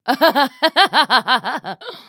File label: laugh; Sejuani